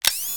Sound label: toggle on; Original TK Sounds